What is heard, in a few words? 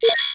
beep